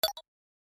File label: pick box